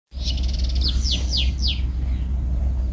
baumpieper

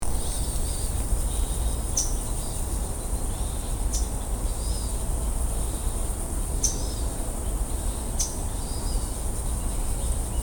junger eisvogel; Eisvogel juv. bettelt

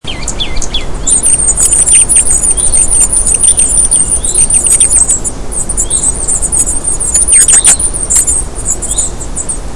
wintergoldhähnchen

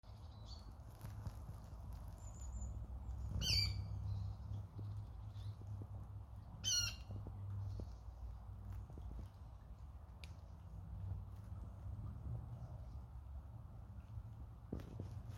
wasserralle; teich; teiche

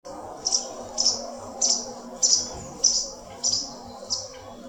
bachstelze, rufe vögel; voegel

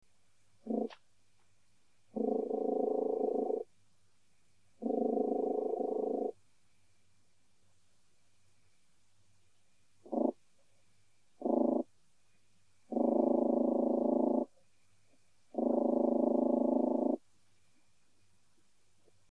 gewöhnliche eichenschrecke, heuschrecken; Gemeine Strauchschnecke; Leibniz-Institut für Evolutions- und Biodiversitätsforschung an der Humboldt-Universität Berlin

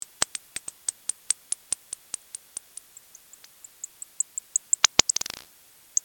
rauhautfledermaus, fledermausrufe; fledermäuse rufe

